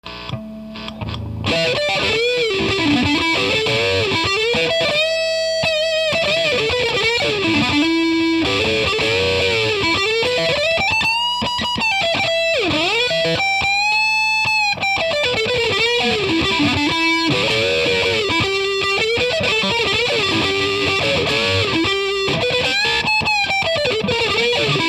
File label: strat